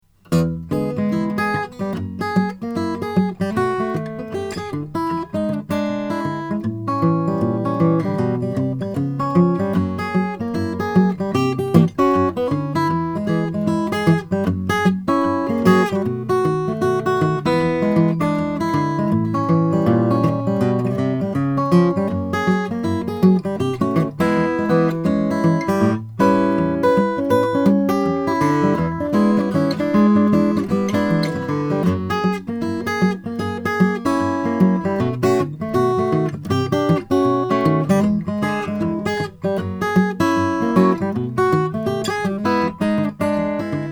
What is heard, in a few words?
martin 000 21 brazilian rosewood